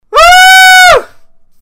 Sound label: diamond scream